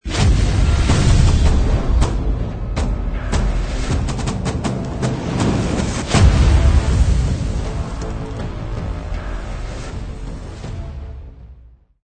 Fire Logo

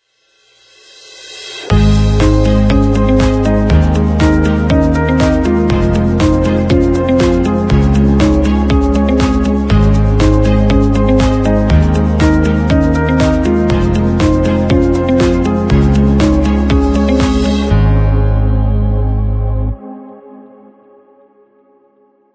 Innovation

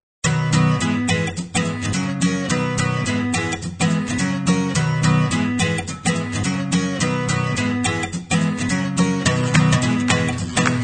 Happy Every Day no bells

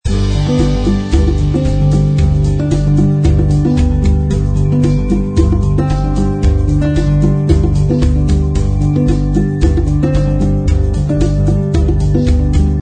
Vamp No Piano; 夏日温馨套装 Warm Summer Kit

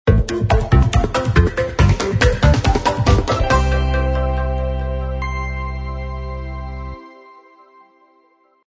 Good Result logo; 企业标志包 Corporate Logo Pack

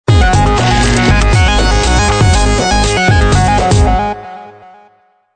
Electro Streak Logo with SFX

